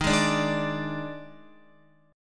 Eb Major 7th Suspended 4th, Guitar; strummed; EbM7sus4

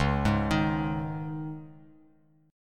C sharp Suspended 2nd, Bass Standard; arpeggiated; x,4,6,8

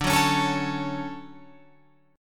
D Major 7th, Guitar, Open F; DM7 strummed

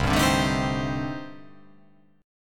C Minor 6th Add 9th C

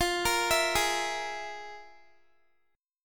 F Minor Major 7th Double Flat 5th, Guitar; FmM7bb5; arpeggiated; x,20,20,21,20,x

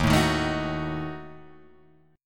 Eb Diminished, Guitar Open G; 1,2,1,2,4,4; down

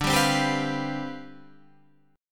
C Major 7th Suspended 2nd Suspended 4th D, chord

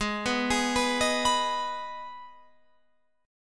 G sharp Minor G sharp down Guitar Open F, chord; G#m arpeggiated

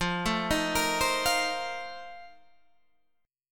F 11th F, down, Guitar Drop D

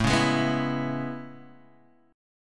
A Major 9th A, Guitar, DADGAD

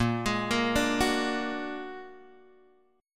Bb Augmented 7th Bb down Guitar Open G

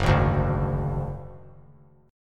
Gb Suspended 2nd Sharp 5th 3C,3F,3A,3D; Gbsus2#5 strummed

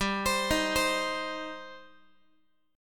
G Suspended 4th Sharp 5th, Guitar; Gsus4#5 arpeggiated; x,15,15,13,16,x

